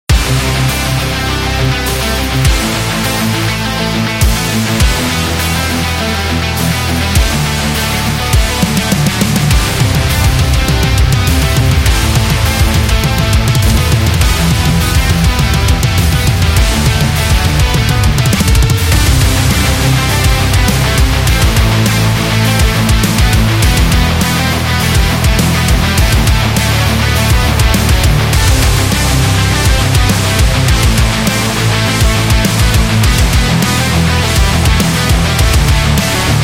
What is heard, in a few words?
The Heavy Metal